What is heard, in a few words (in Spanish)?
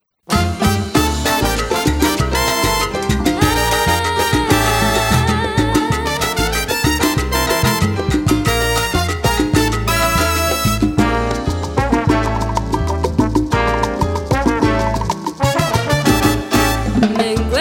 Salsa